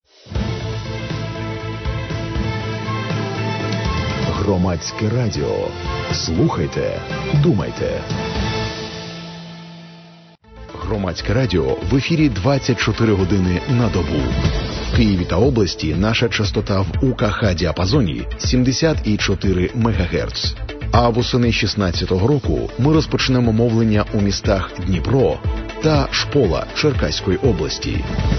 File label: interval signal; ukr; public radio